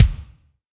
present kick single hit a sharp key, Royality free bass drum sample tuned to the A# note